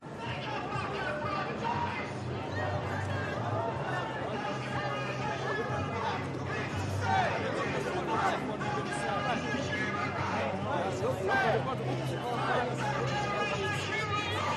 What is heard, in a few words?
atmos protest passing by chants megaphone, london; nhs; london music mixing